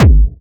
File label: Harder, kicks